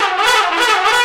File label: trumpet danger